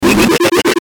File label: Glitch Garble Hiss Harsh Noise; Glitch Sound Effects; Production Element Imaging Element Accent Transition